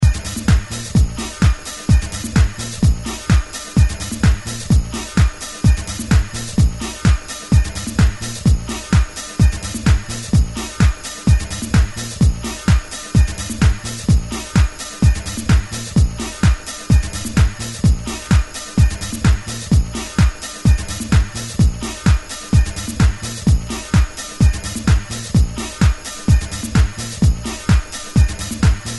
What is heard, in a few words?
Club swing